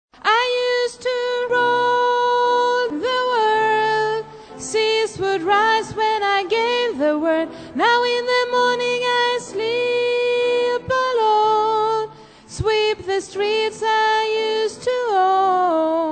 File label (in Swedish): sopran, stammor